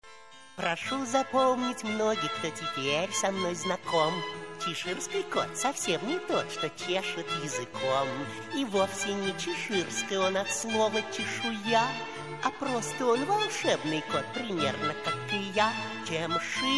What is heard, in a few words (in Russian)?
песня Чеширского кота; Чеширский Кот